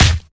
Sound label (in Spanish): pelea